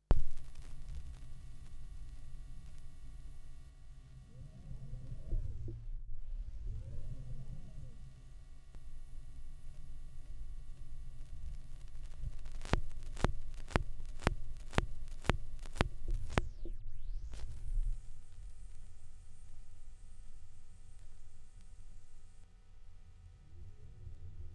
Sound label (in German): schallplattengeraeusche, Vinyl